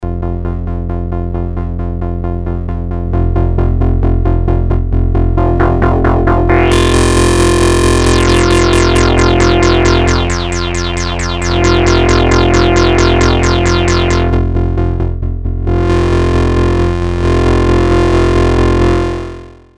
test overdrive, novation bass station 2; analog monophonic machine with subtractive synthesis

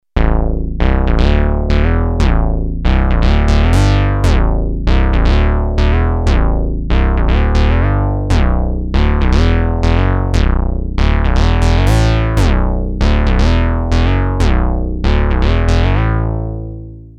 synth moogish, Moog minitaur; Minimoog D; analog monophonic synthesizer; 2 VCO oscillator

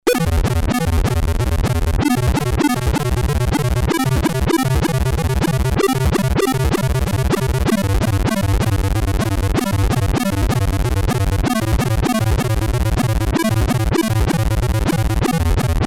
synth arp, emu carnaval; Synth arpeggio; Motorola 68000 CPU (mc68hc00fn10) system handles up to 32 voices polyphony

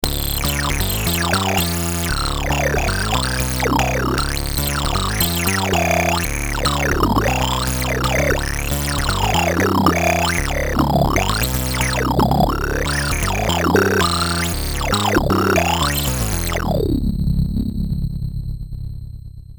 synth modulated, Polivoks; Polivoks formanta

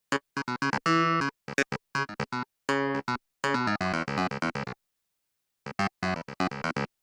clav vinet, Roland dj70

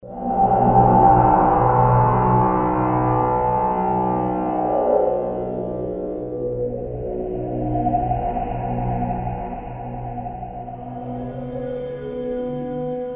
amb; Ambient: speaking cymbal; Emu Xtreme Lead-1

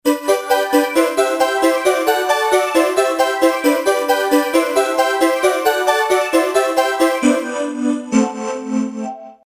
synth happy; emu carnaval

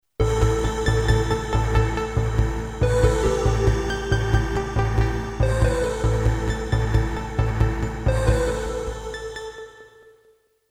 yamaha tg33

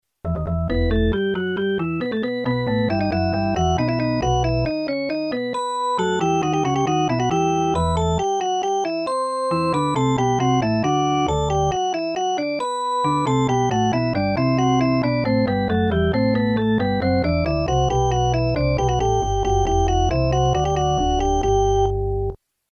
organ, Yamaha TG33

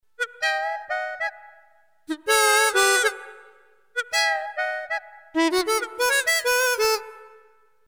harmonica, Harmonica, Roland JV2080

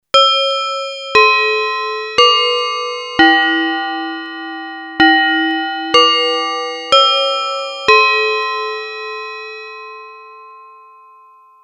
bells dx, yamaha tx81Z; Bell: dx like

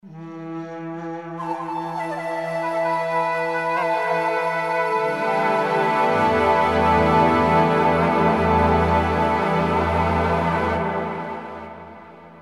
ethno, Roland JV2080; Ethno: another cliché

